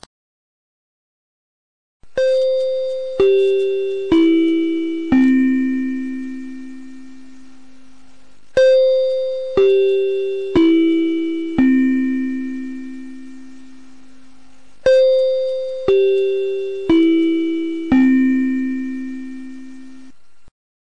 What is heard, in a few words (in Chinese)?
下课铃; 学校常用铃声